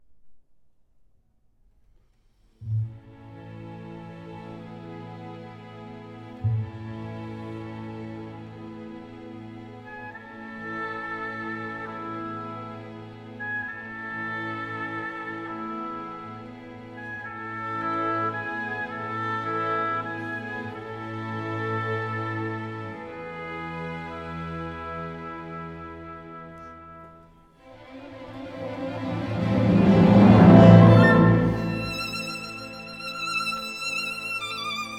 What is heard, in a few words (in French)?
Fantaisie norvegienne pour violon et orchestre Allegretto non troppo